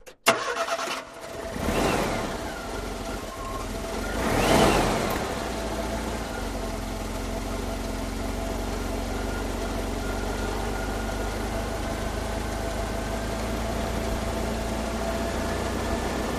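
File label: Jeep Cherokee Start Engine, Revs; Jeep Cherokee 1984 Start Engine, Revs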